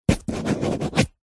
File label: office pen biro write sign signature on glossy paper